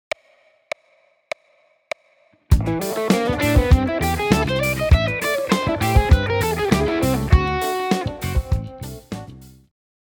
blues fusion

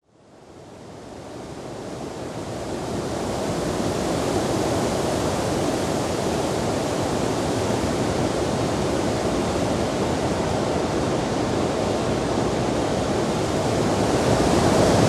Soothing Winds, meditation music

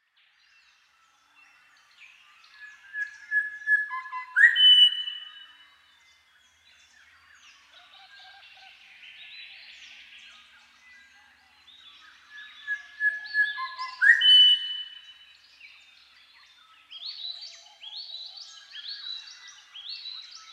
Grey Shrikethrush Colluricincla harmonica

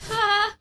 Laugh

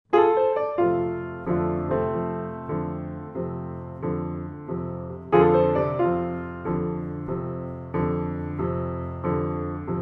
Blues

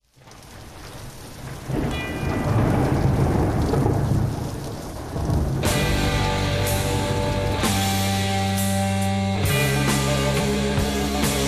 descending tritone

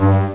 low, beeps

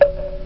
clicks; These sounds are suitable for using directly in a <audio> tag in SSML

